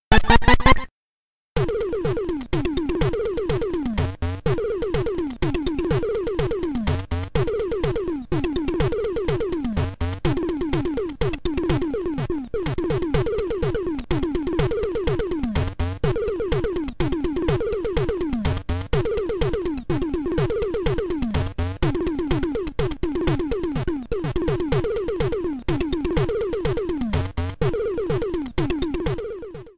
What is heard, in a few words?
Music Miniboss; VBWL